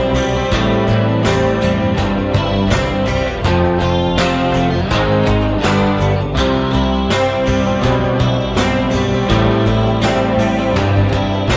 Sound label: instrumental